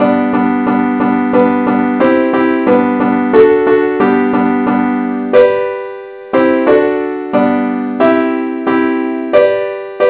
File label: simple acc key g; christe Sanctorum